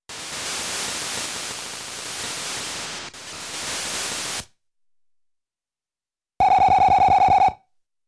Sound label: Windy Sound ~ Slip Sound; super mario world live